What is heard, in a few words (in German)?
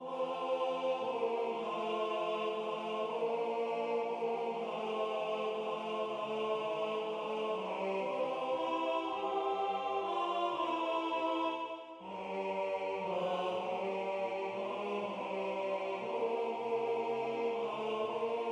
Lied an den Mai Tenor; Lied an den Mai Version 1